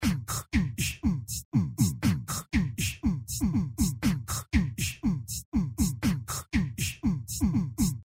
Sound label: vocal rithm ritmo vocal gutural; produced by luis jardi; Sound-Graph